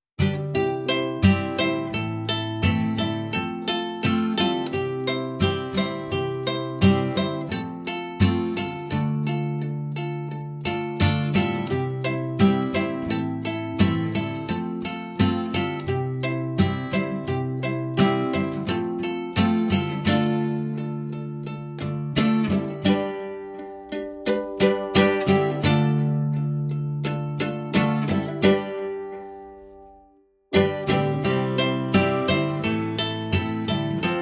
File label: Piano Ukulele Acousticguitar